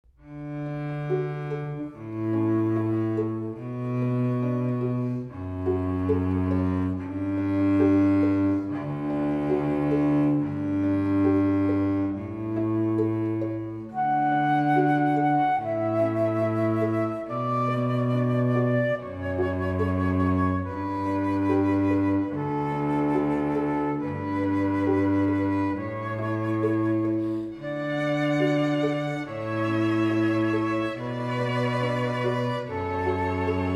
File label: Canon in D, Flute Quartet